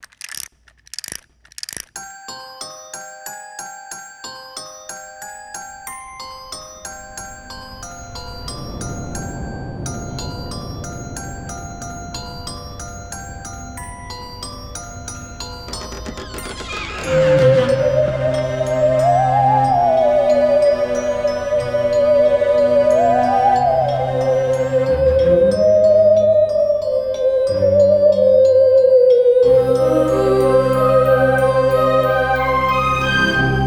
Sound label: Haunted Music Box